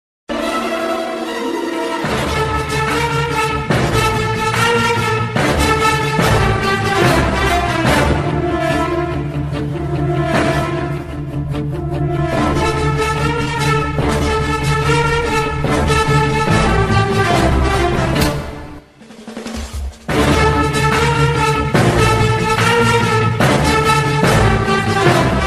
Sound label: mass bgm ringtone, tamil bgm; ayan; surya